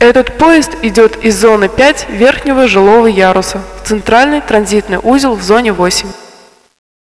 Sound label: tram